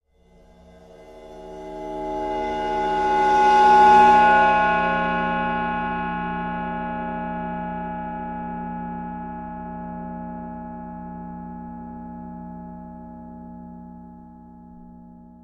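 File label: Ride 22 Cymbol Bow close up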